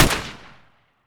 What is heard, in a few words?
sr25 fire, sniper; weapons; garrysmod